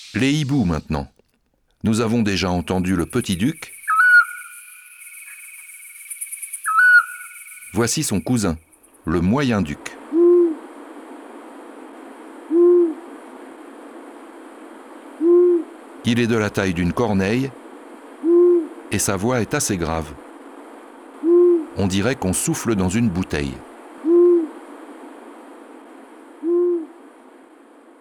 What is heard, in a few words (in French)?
Hibou petit duc et moyen duc; abc de la nature